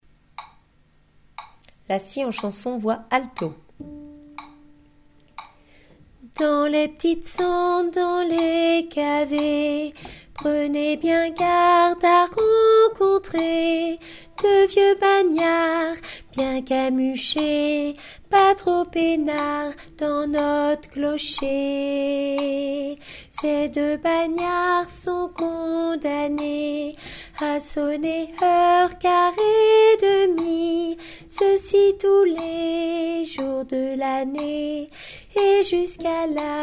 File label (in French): alto